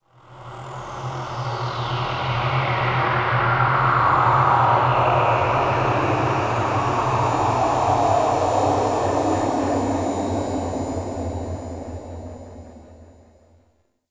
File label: Exterior Ominous Void Weave; Ambience; Warframe; Railjack